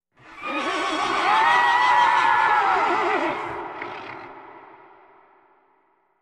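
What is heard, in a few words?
Animal Stinger Eerie; stingers & whooshes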